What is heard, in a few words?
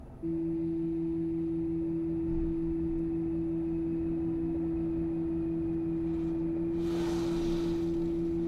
pedestrian warning; pws drive forward